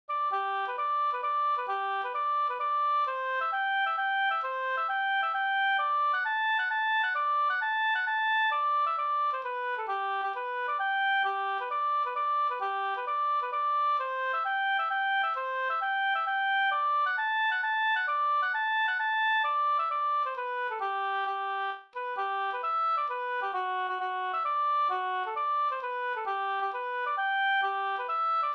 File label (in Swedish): polska trestegspolska